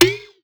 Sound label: Perc; Sample Banks; Percussion